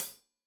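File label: hat closed, hi-hat cymbals stereo; Deeply Sampled Drums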